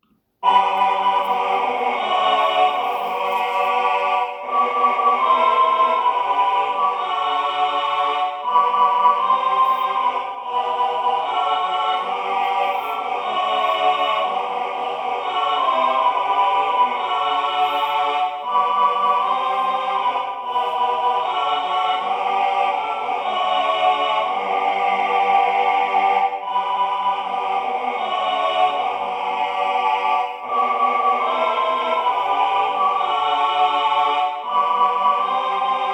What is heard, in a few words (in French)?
Tu m'as sauvé chœur